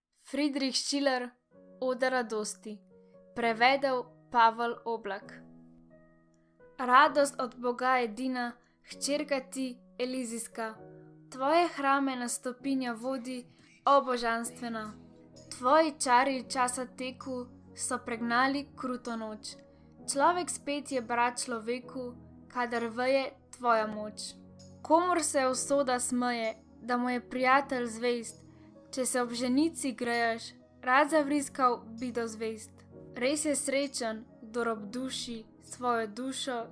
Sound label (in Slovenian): Oda radosti slovensko besedilo